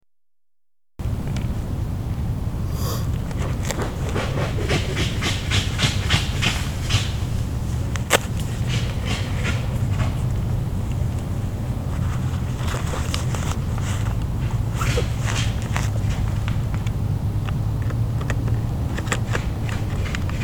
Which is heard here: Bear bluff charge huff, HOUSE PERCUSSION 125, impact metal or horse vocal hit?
Bear bluff charge huff